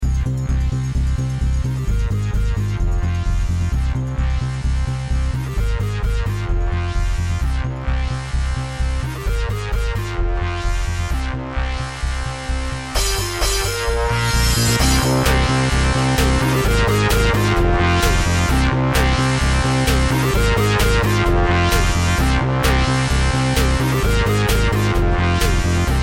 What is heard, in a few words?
Club Mix